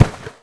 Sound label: Keeper Catch Ball